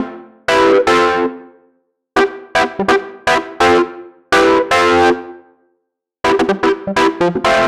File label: Brutal EP